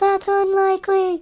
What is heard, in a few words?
original vocal seed